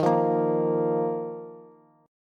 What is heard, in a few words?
Eb Suspended 2nd, Bass; Ebsus2 strummed; JGuitar